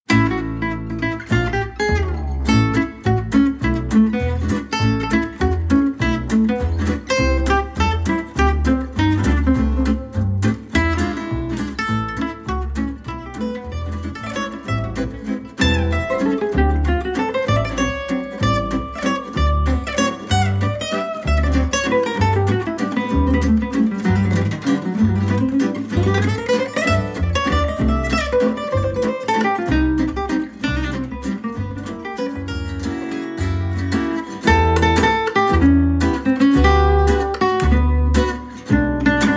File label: Gypsy Jazz Sampler